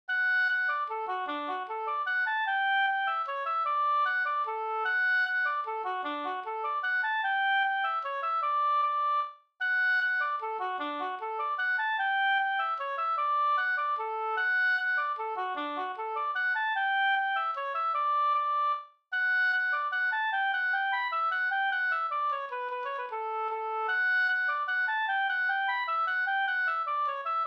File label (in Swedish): mazurka